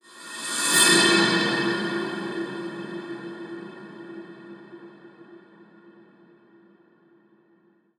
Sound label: Small Swoosh